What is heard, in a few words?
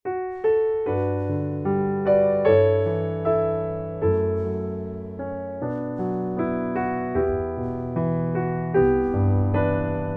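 piano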